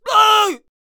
Squire Attack Vocals

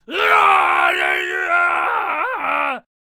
Headbutter Attack Vocals

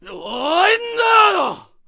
Samurai Attack Vocals

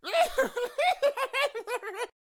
Mad Mechanic Attack Vocals

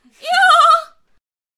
Death Vocals; Artemis

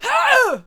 Attack Vocals; Sarissa

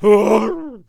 Bard Death Vocals

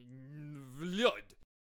Vlad Attack Vocals

